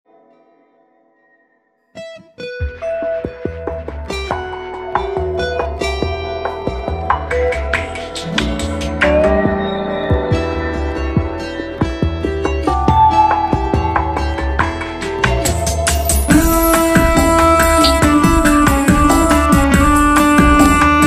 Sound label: elettrobossa